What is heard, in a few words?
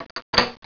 locked